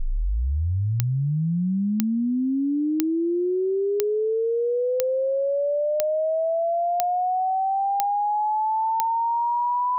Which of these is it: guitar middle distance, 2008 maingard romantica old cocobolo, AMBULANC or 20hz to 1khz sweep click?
20hz to 1khz sweep click